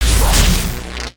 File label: dummy spawn